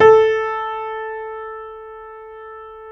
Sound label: JAZZ A0, Keyboards Acoustic; USB Soundscan vol.17